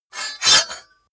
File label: sword cut hard light